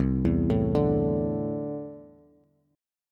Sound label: C 6th Add 9th C down Bass; arpeggiated; JGuitar